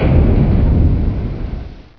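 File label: platform stop; Source Engine; bshift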